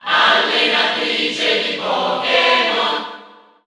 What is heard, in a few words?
Cheer Italian; Pokémon Trainer Female; SSBU